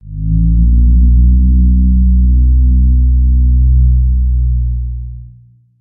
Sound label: moan; ambient; outdoor